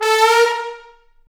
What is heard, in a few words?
BRS TBN SLI, Bone Sec.FX; Roland LCDP06 Brass Sections